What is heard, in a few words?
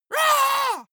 monster growl attack high; schreie